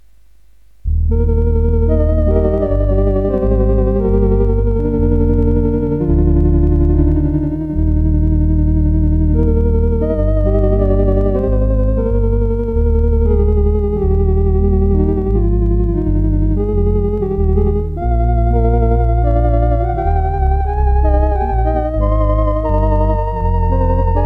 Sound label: How Sweet the Name Of Jesus Sounds, Organ